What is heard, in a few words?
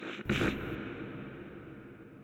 snort; fowl-play; poultry-man-menu